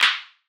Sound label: tech house clap sound